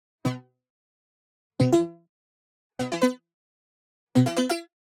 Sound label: Digital Click Positive; boom library; The Interface